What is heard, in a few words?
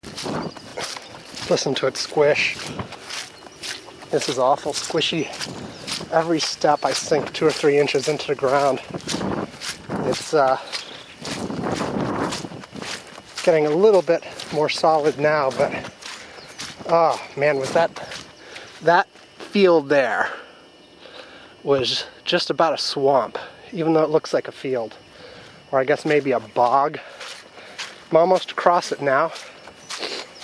More Squishing; Aylesbury trip; Some quick sound bits from the Aylesbury trip.